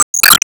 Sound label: Ball Hit Killer